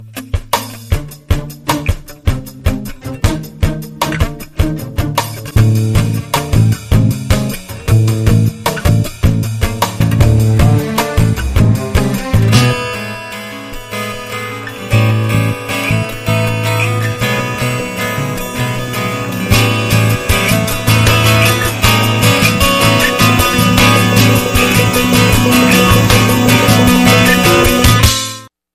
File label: Groove